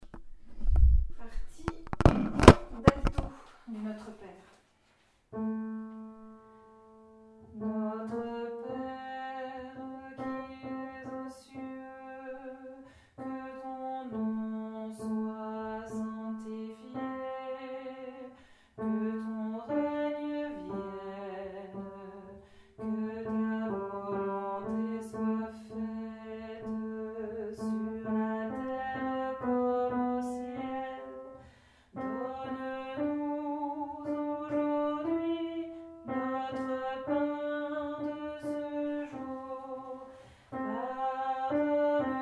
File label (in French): Notre Père alto